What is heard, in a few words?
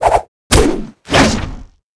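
Attack Sword; Seer; GamigoNA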